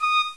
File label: pipe